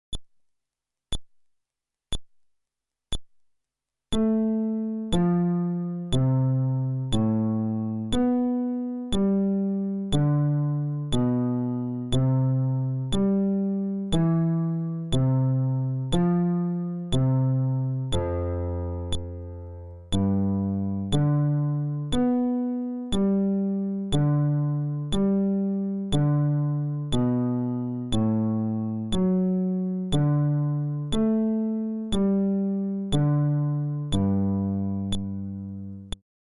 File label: Arpeggio, fretless bass